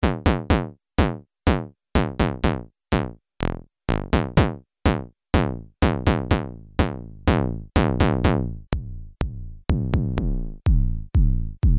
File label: MODOR DR2 SQUARE BD